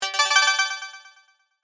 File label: Multimedia Chime, Technology, Multimedia, Chime; Chimes